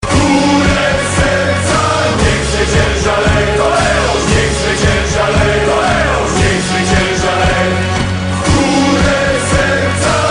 Hymn; Lecha Poznan

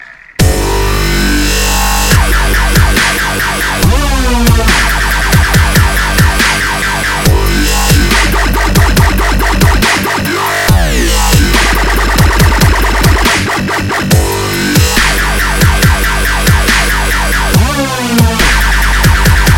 Dubstep

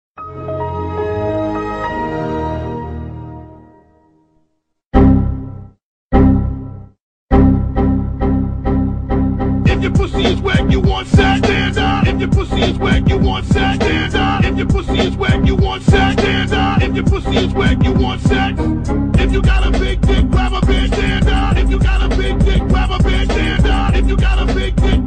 Windows Rap

